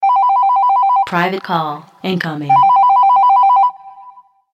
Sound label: Incoming Call